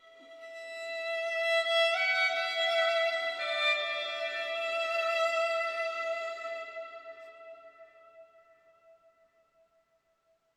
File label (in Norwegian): fiolin strofe